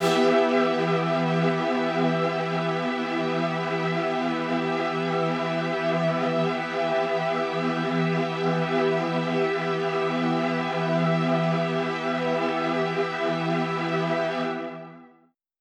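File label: Poly String E minor, synth orchestra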